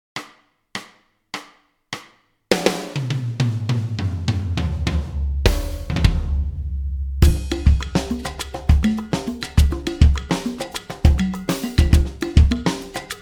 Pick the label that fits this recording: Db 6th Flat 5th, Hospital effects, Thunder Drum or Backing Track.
Backing Track